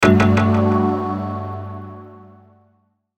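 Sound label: Hit Mallet Percussion, Hit Mallet Vocal Pad Delay Low; Mallets; Blastwave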